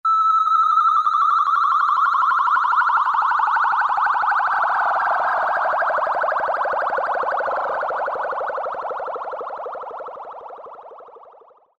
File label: Sci-fi Sweep, Roland V-Synth; Factory Patches